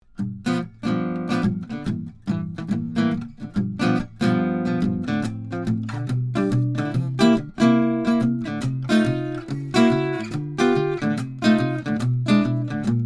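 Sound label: Jazz, Sennheiser MK8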